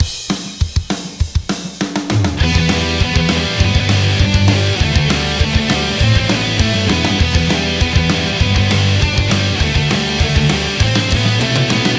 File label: Kick Flip